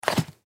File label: Stiletto Heels Down, Stiletto Heels Set Down Carpet; Shoes; Foley; Blastwave